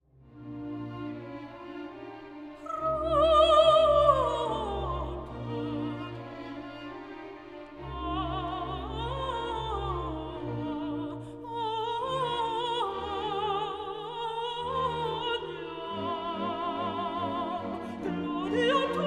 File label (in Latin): Gratias melismas